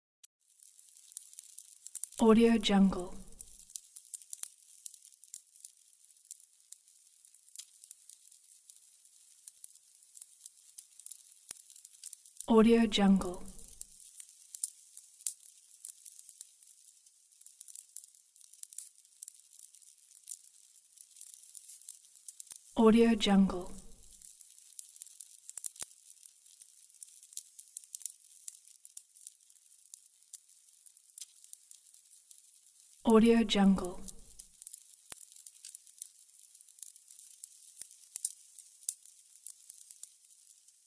flame candles

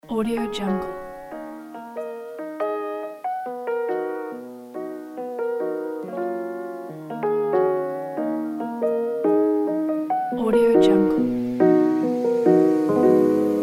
piano hip hop

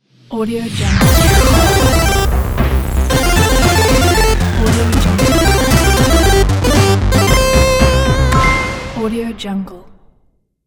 8 bit logo

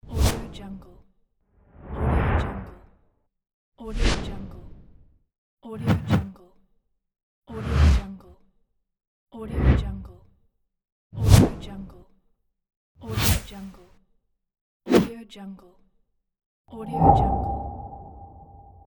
wooshes

